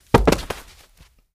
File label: Body Falls On Carpet